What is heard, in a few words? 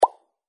move